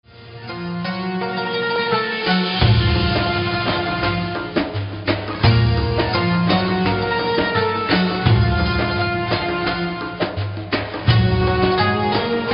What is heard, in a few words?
Mobile ringtone